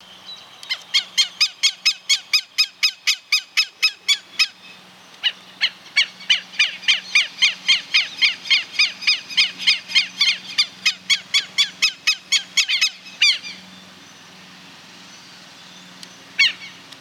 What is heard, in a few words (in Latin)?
Vanellus chilensis Alarma; Esperanza